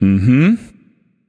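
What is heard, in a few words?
non verbal agree, voice; cernunnos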